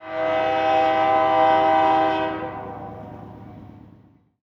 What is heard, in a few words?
train horn distant; ambient